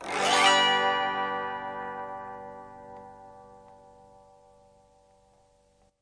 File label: autoharp G, autoharp chords; Multisampled Autoharp